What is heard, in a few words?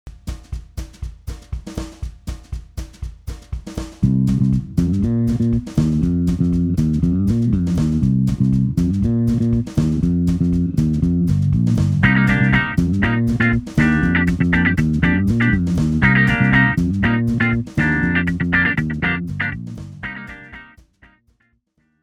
Intro Music